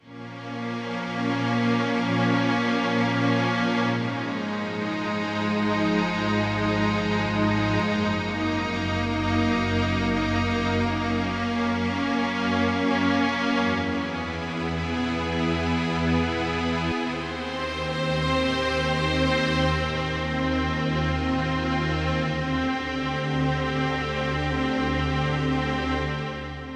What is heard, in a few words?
Seamless Soundtrack, Yamaha TX16W Sampled Strings